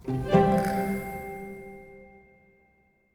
medium win; netent

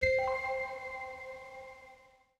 spin music click

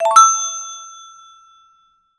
add fs land; netent

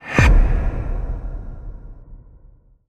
ghost pool; netent

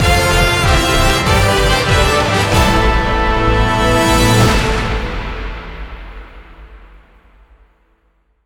big win end; netent

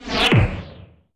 sign smash; netent

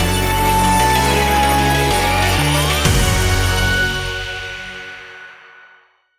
Large win; netent

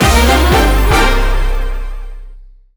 large win

